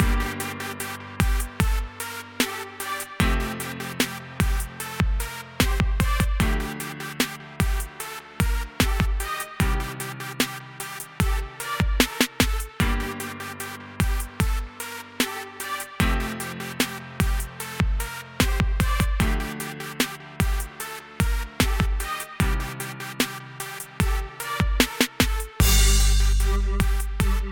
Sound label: stringy trap